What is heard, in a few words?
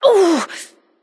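grunt hit, female; smite; voicepack; hera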